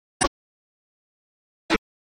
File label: Auto, Cartoon Zoom By, Auto, Cartoon Auto Whizz By, High, Zoom; HB04 - Hanna Barbera; Brown's